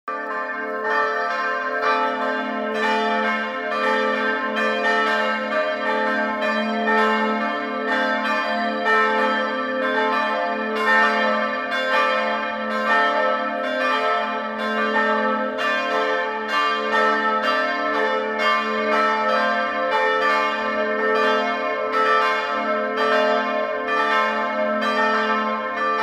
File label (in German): glockenlaeuten, Kirche; erloeserkirche erlangen